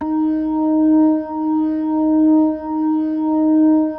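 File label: E flat 4, B3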